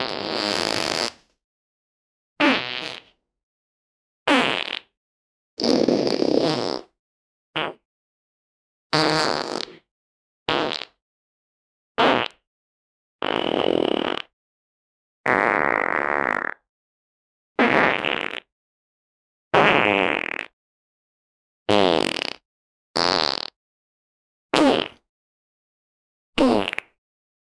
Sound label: fart sounds; flatulence